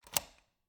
Cabin, front door, deadbolt, lock, latch, thick, open, hyper; Eiravaein Works; Vaeyan IV